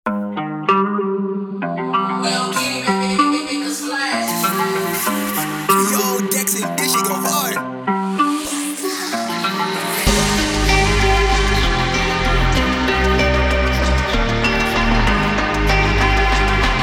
nightcore bassboost

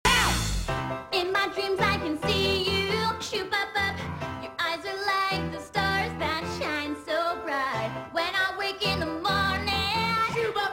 Singing Medley

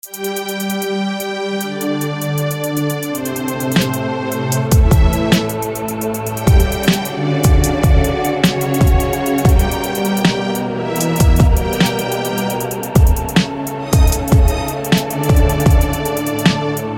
TrapHop

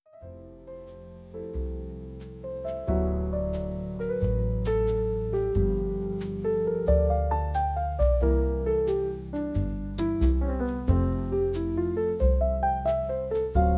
Soft Jazz

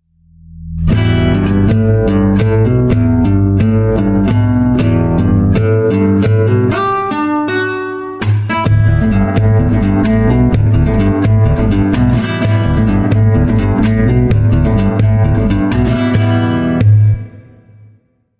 acoustic

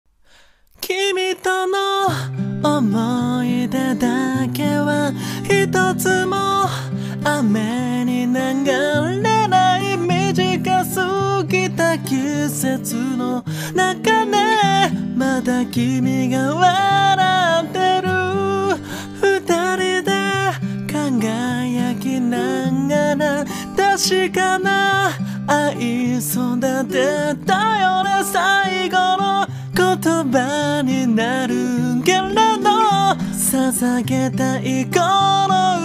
acoustic cover

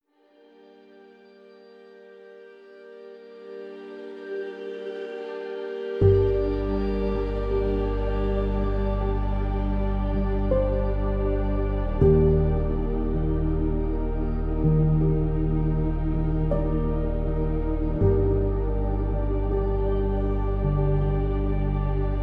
Chill out Music, Celtic Harp Sound

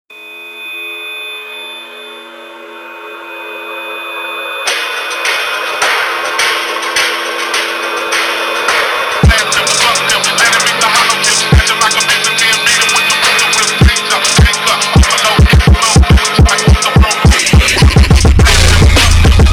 FONK DLYA DRIFTA Sped Up

